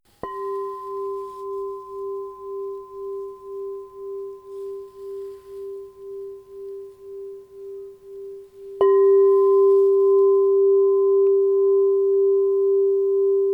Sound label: 7inch G +5, crystal bowl